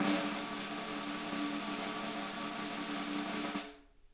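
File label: snare roll, drum kits; Sonic Reality; Interactive Drum Kits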